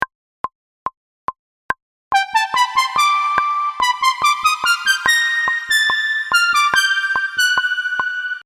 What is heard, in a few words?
Lead C